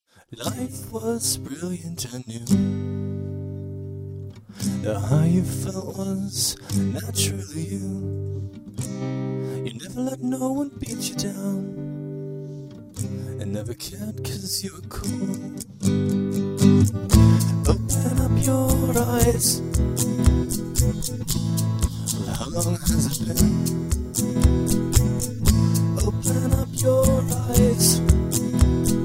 Noise backing

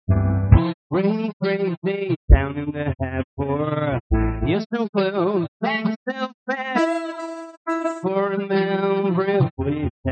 bearlin roads, solo

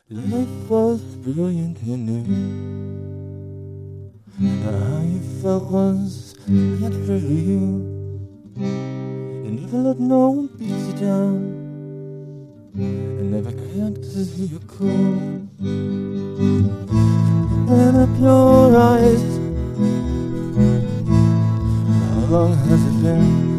harmonic

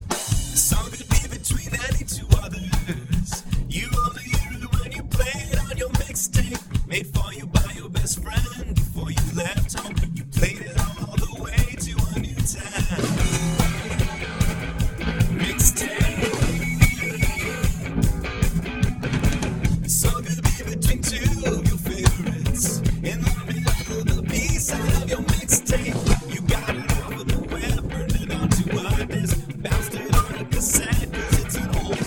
percussive

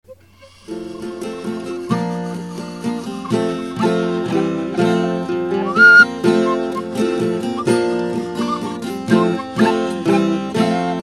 accompaniment No Attacks

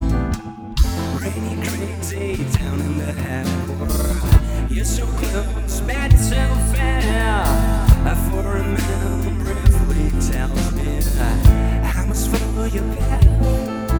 bearlin roads, backing

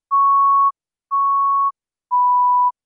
Pure Tone

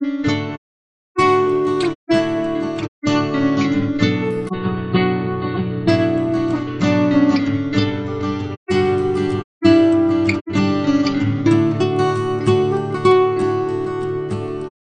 Seed Noise solo

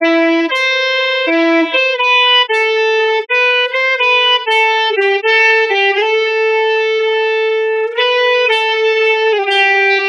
natural minor solo full

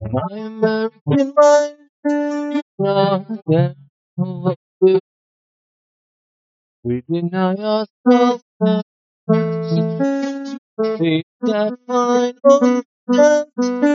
solo

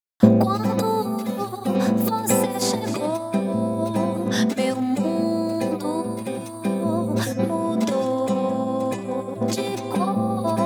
backing

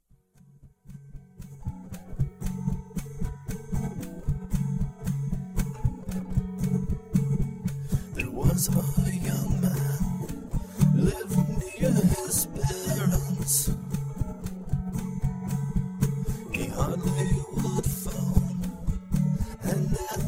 percussive

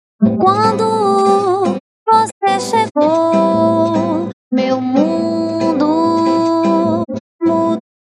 noise solo

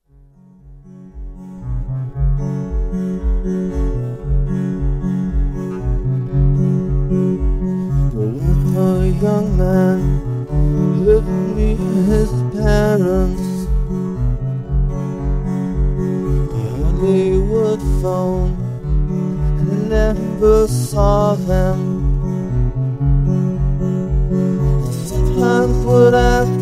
harmonic; Phase based harmonic percussive separation; reference algorithm

